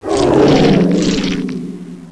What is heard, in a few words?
die, monsters, devourer; svencoop; AoMDC